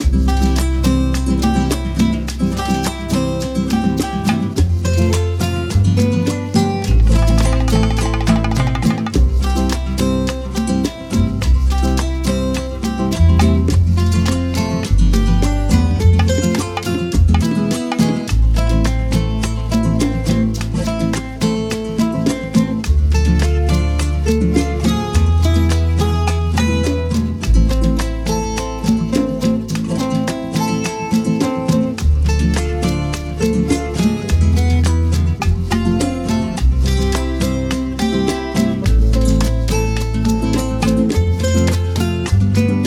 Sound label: Peruvian Traditional Dance w Tres 122 105 bpm